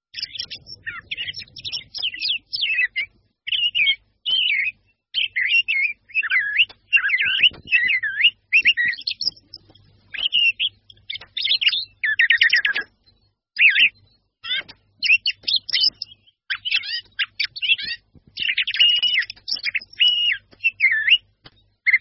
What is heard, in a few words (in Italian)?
tordo